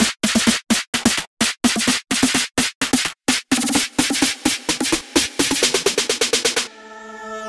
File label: Buildup Drum - 128 BPM; Cymatics - Odyssey